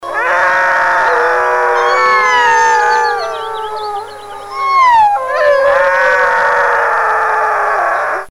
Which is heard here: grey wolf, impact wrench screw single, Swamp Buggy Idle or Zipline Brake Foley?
grey wolf